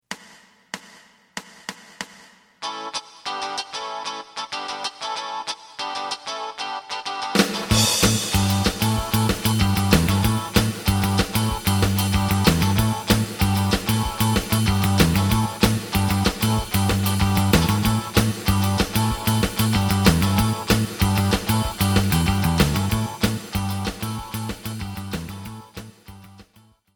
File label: lead